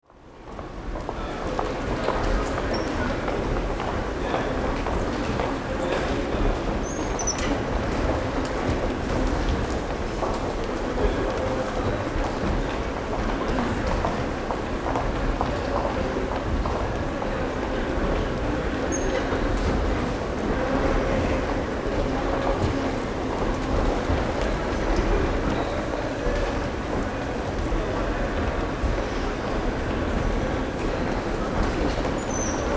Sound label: Foyer